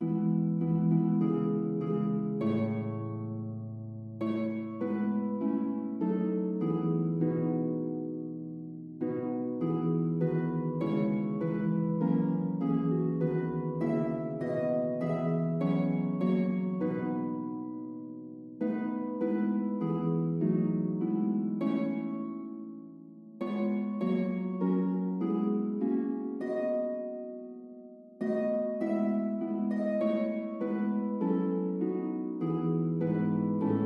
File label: harp; crown him with many crowns